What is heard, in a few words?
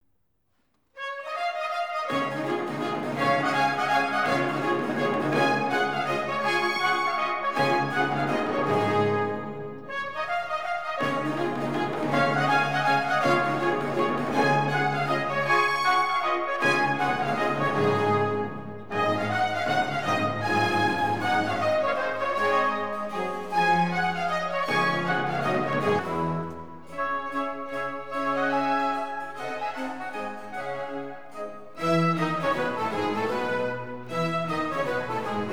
Menuet Gavotte; Jean-Philippe Rameau; Les Sauvages; Menuet I II